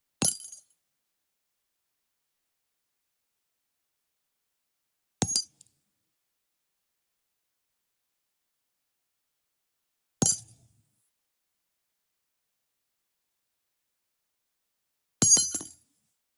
casing 50 bmg dirt; sonniss gdc

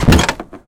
demolisher robot mechanical footsteps rusty creak; sonniss gdc

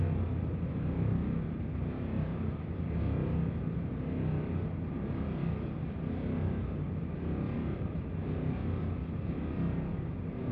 industrial ambient drones; sonniss gdc

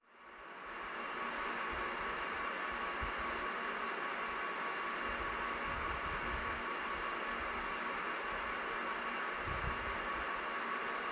indoor server room; Sonniss GDC

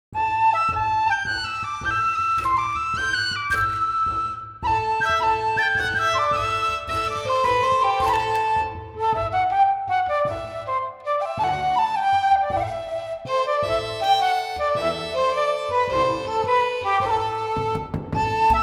tavern, music packs

